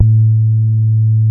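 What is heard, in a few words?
SUPPORT BASE, BASSES; Masterbits; Vintage Voltage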